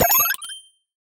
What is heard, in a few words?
Timer Clock Get; SM3DL